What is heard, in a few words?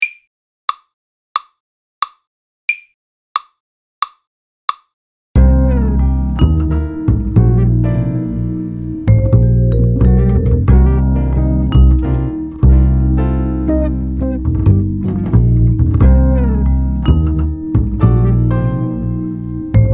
Jazz Groove